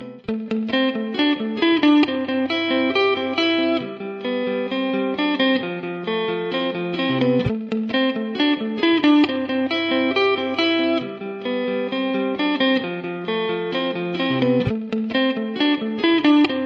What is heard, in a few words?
motor combat guitar ringtone